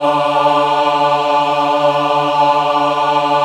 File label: D3 MW AH, Choir; USB Soundscan vol.28; Acoustic & Synth; AKAI